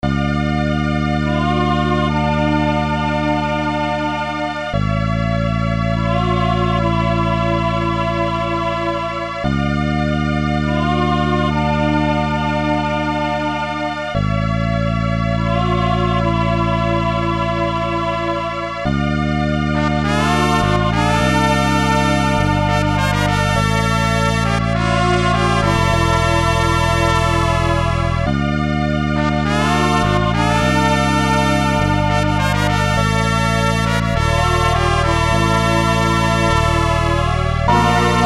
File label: Rainforest; Endica VII The Dream King